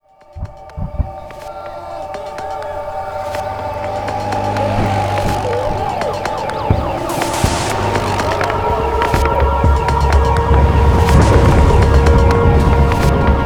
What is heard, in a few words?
Music Loading